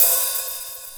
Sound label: HIHAT OP; Groovy Bytes: Behind the Moon